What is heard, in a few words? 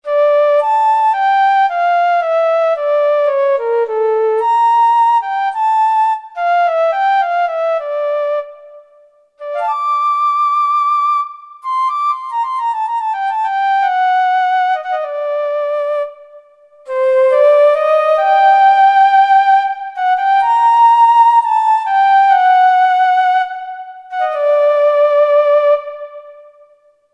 Solo Flute; Kurzweil K2000 Orchestral ROM